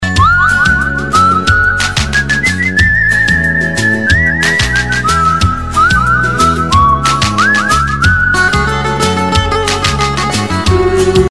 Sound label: whistle